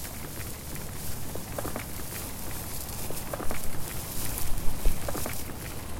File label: Run Loop Grass